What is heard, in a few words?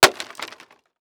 Impact Wood Crash Mic On Floor